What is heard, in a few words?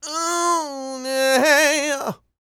Vocal Planet R&B & Dance; ILIO